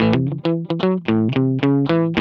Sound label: GUI, LICKS; 110 LICKS